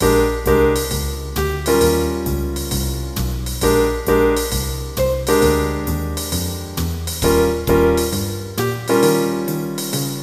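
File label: b flat blues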